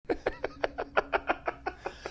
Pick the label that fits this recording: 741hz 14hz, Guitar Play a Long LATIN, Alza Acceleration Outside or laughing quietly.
laughing quietly